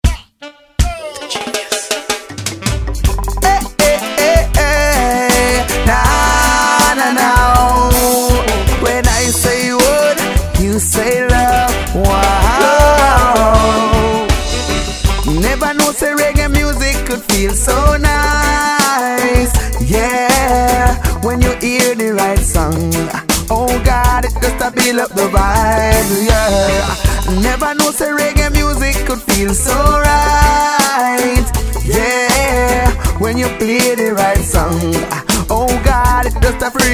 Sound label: Solo Reggae Music